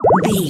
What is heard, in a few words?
ui menu button beep